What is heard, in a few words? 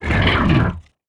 Robo Death